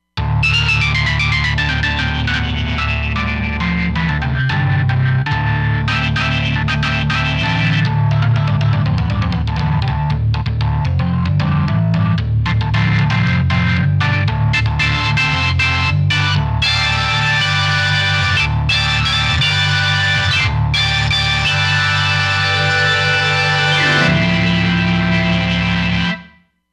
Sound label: Purple Organ, Roland Fantom XR